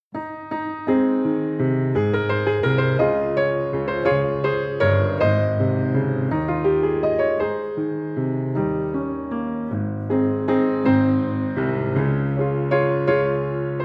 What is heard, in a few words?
waves playalong